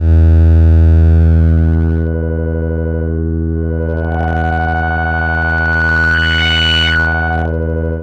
EMS MOD RES3, EMS synth; TECHNO-BWARE; EMS SYNTH-A

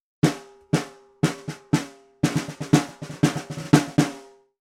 Vintage Ludwig Snare, Percussion; Continental Drift Aftershocks

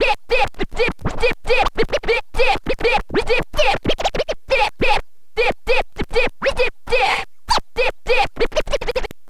scratch med, Vinyl Attack scratches mix

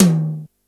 tom, Yamaha RY-8

